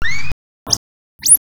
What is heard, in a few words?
warble